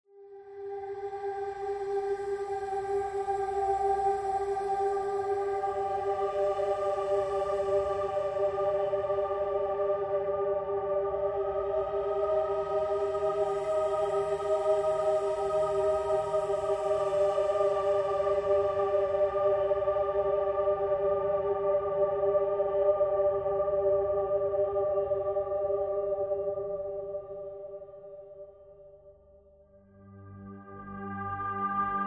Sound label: ambient